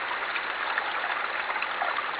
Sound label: fountain